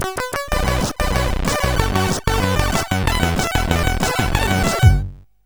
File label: sid CSG8580R5